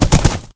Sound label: gallop, horse; minecraft